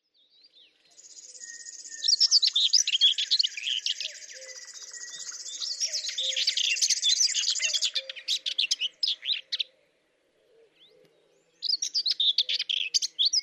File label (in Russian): Seraya slavka i drugie